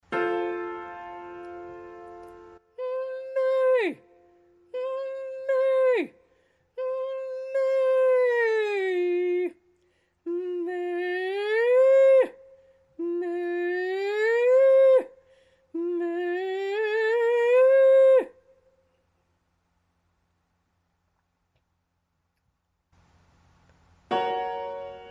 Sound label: Block On Hmma; vft practice plans